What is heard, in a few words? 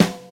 rich lows snare f key, Royality free snare drum sample tuned to the F note. Loudest frequency: 1107Hz; snare drum samples